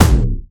explosion dark; Epic Toon FX

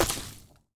explosion lava

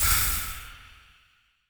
explosion poisoncloud; Epic Toon FX

